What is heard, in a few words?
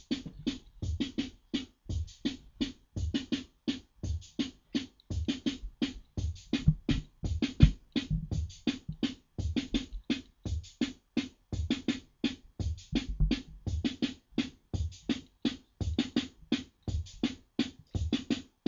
waltz